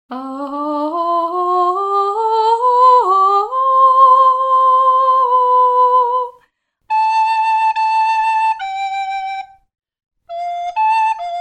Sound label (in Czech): zensky Vokal Sopranova Fletna